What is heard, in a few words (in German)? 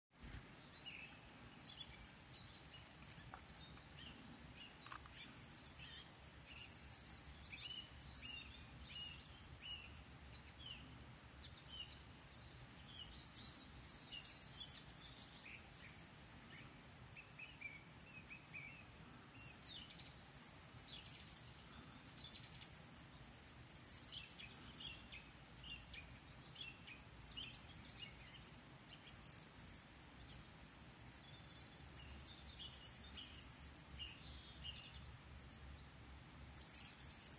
vogelgesang bach